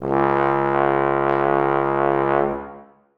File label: bass trombone c#2; Sonatina Symphonic Orchestra